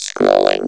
scroll, computer; Digital Sound Gallery - Volume 1

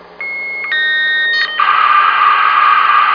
modem pick-up; Bible Fun Pack; Education Publishing Concepts

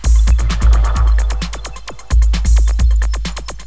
electro; Guitar&Electro

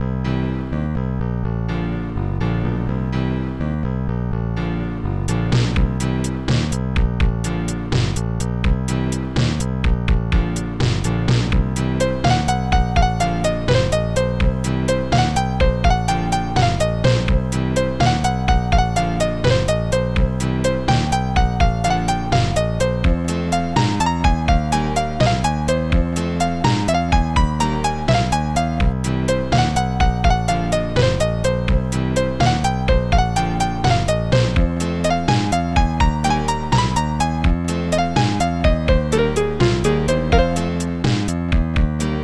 bar blues

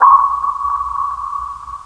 SONAR; chaos

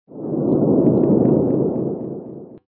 ceiling sound; bubble t

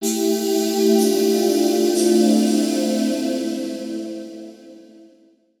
SYNTHPAD, 125; Sphere

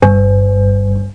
Bong; Cloudz 1.0.3

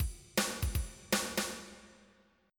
swing

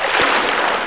water

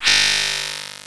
Funky Whish; Mille Bornes

